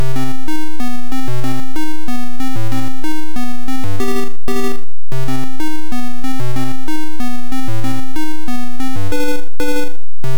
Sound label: In game, Amstrad CPC; Rampage; CNGSoft